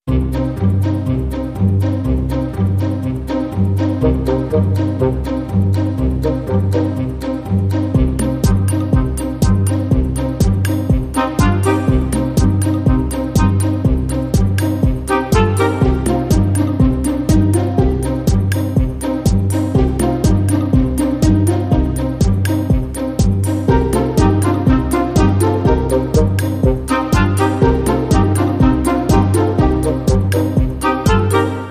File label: Modern Percussion No Woodwind Leads